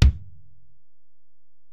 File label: Ac BD, Alesis DM-PRO; 300 Drum Machines